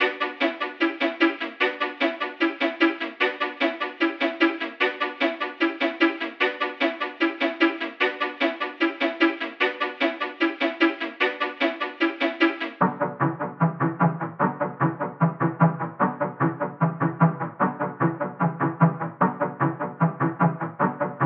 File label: 150 BPM B Min Strings; Melody; Trap; Loop Stems; Cymatics; 2022 Melody Collection - Trap Pt 2; Invader